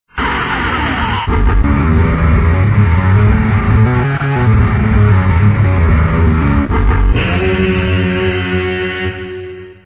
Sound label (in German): klavier